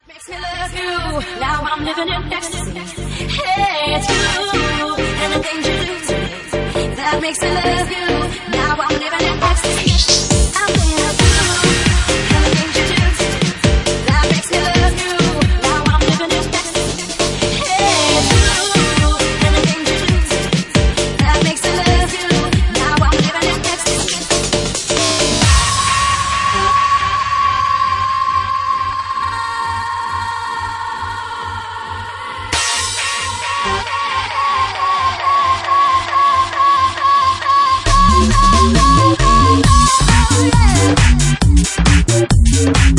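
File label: Bassline Remix, Bassline House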